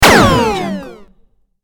weapon laser shot

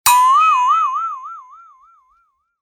cartoon head knock accident sound

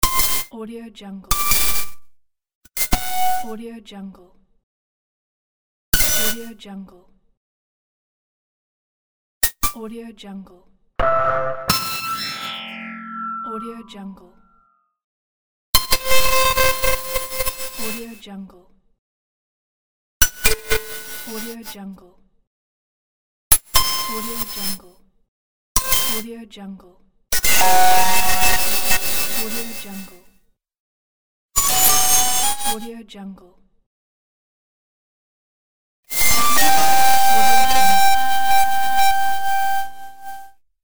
glitch sfx; volkovsound

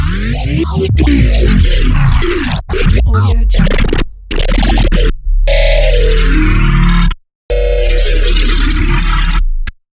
robo synths robo scratching; sinematic source recordings

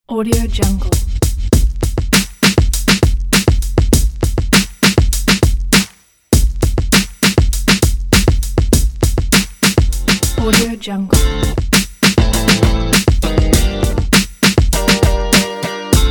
cool groove hip hop funk

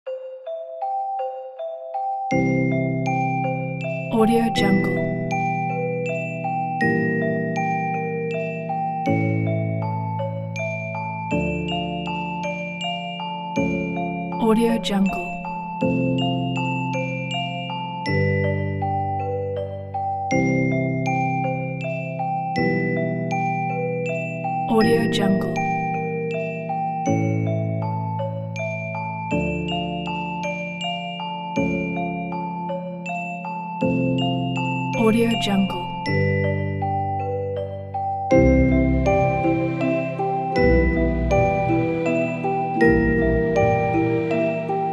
bedtime

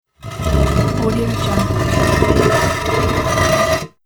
cement dragging grind

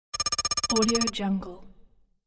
data readout

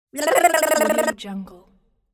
cartoon tease tongue blah blah

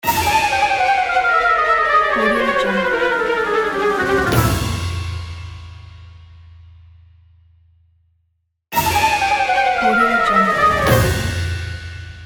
cartoon orchestral falling; gamechestaudio

